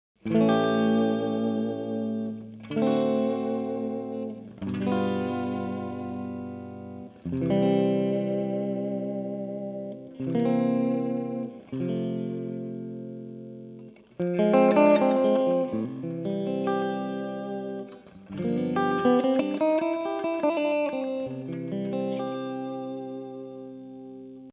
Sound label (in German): Gretsch Mitte clean